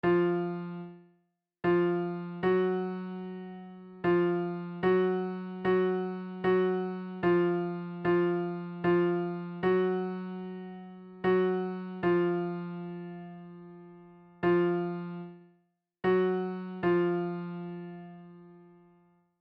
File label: minor 3